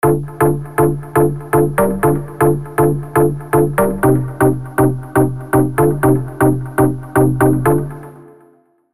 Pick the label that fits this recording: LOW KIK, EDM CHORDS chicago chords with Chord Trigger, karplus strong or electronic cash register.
EDM CHORDS chicago chords with Chord Trigger